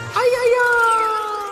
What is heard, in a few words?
ayayaaaa